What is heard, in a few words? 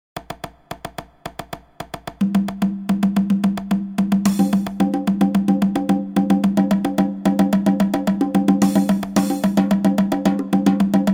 Disco Bongo; Tsetse Music; afropop playlist